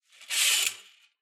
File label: Curtain